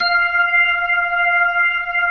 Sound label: B-3 Jazz, keyboards; Keyboards of The 60's and 70's